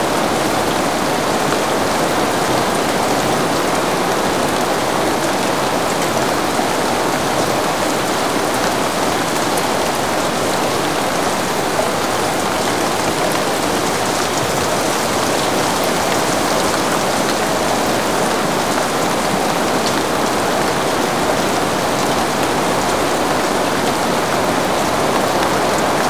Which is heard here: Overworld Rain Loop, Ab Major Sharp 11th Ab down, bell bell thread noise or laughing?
Overworld Rain Loop